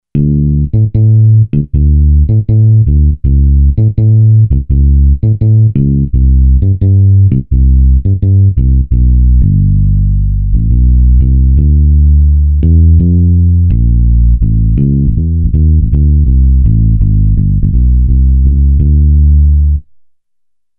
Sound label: Low Finger Bass